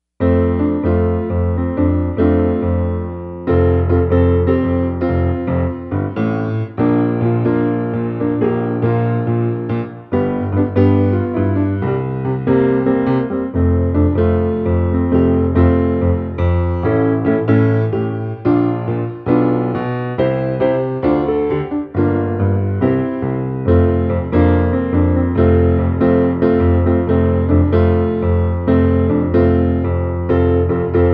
Etude Concert Grand

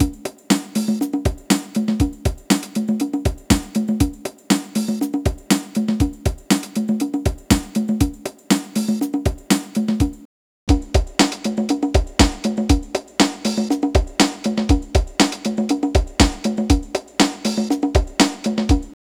Drum Uneffected

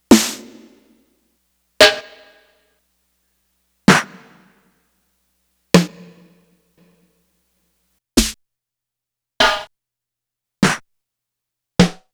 Snares

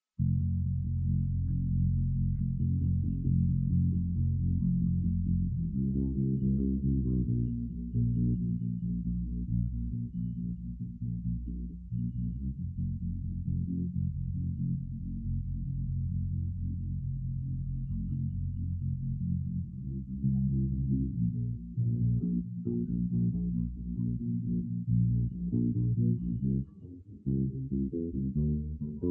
Bass

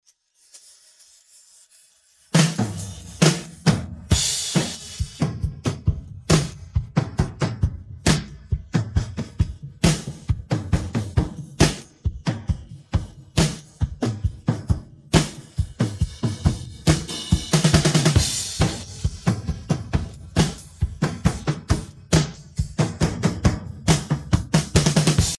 Drums; stem

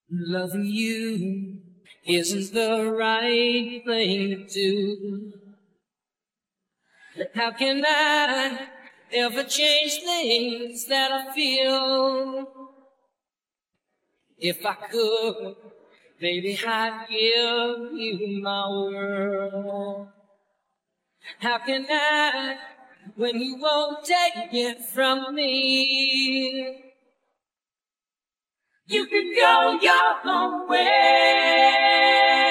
Vocal

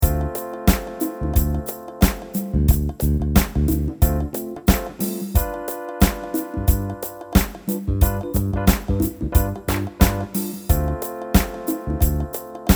Funky Jump

